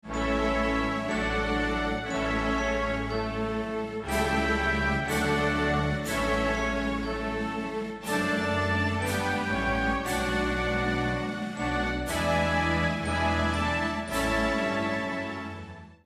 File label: lose jingle; Germany